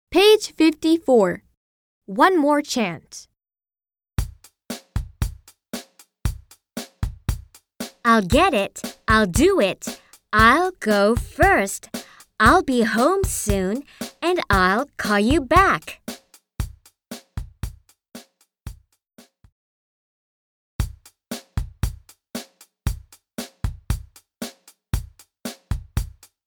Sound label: One more chant